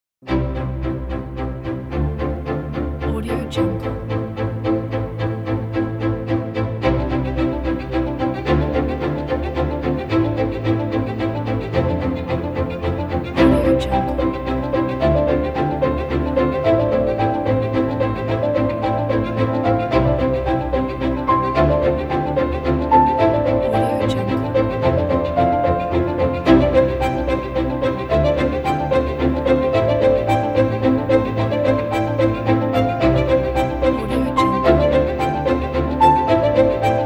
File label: uplifting cinematic strings and piano; music2noise